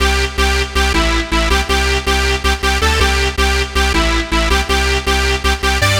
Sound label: Dance Synths, Hardcore Loops, Synth Loops